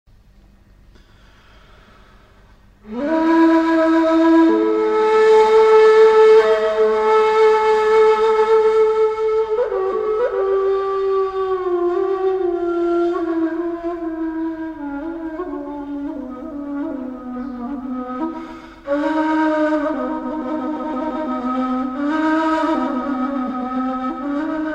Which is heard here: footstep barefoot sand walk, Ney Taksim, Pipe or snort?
Ney Taksim